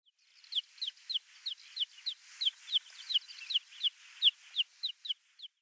Bergkalanderlerche Melanocorypha bimaculata; Vogelstimmen

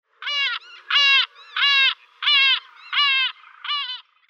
Steppenmoewe Larus cachinnans; Vogelstimmen

